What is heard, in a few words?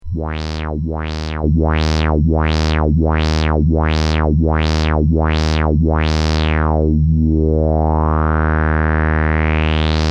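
hellfo pw triangle, synth; hoel LFO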